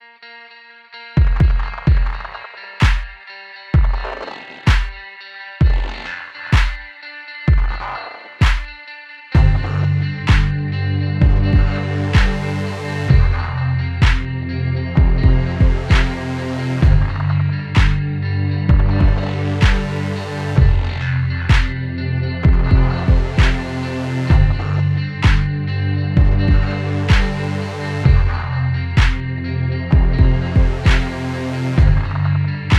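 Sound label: music Level Complete; Enyo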